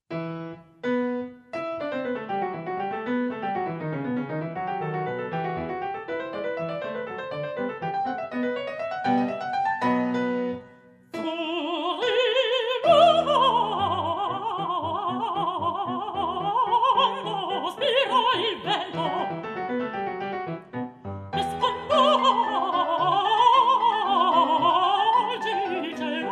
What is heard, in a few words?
Partenope Furibondo spira il vento, Mezzo, Piano; Haendel